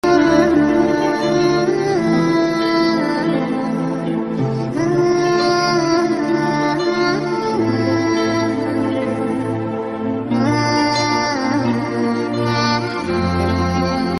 mood off ringtone sad bgm broken ringtone bgm ringtone